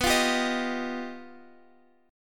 G Augmented 7th

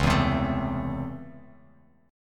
Db Minor Major 7th Sharp 5th, Bass Standard; 9,7,10,14; strummed

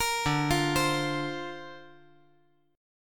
D-Minor 7th Sharp 5th D, chord

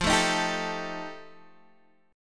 F 9th F Guitar Standard, chord; strummed; JGuitar

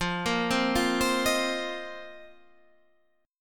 F 7th Suspended 4th F down Guitar Standard; arpeggiated; JGuitar

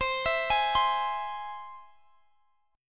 C Augmented, guitar chord

